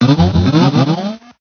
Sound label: talk